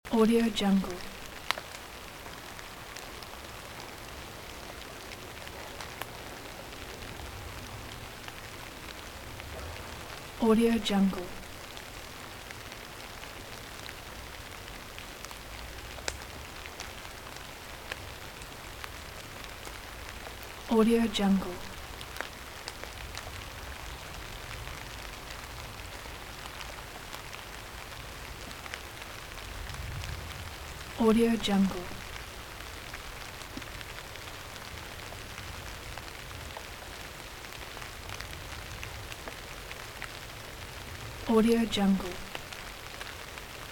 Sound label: winter rain in oak forest loop